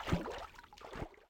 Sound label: Underwater - Swimming; RadwinIsland